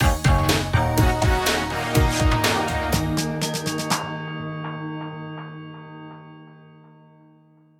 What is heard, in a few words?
win stinger; Marching to battle